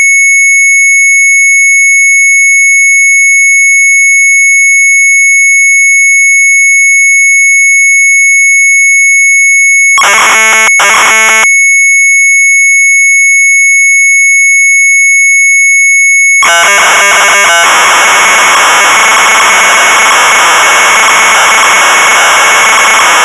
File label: MZ800 Tape, sharp; WISSEN; retromelsarchive